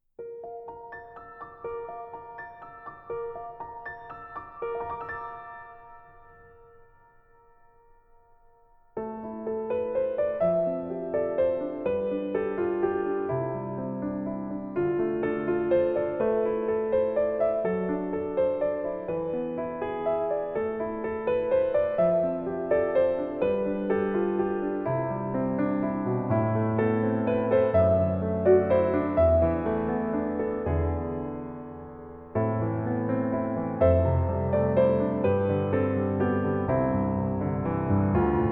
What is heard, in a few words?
Piano Ver; Plain Of Stars; Astro Rain